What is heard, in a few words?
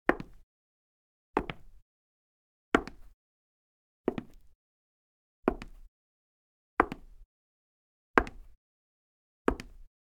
Wood Parquet High Heels, Foley Footsteps; Sound Ideas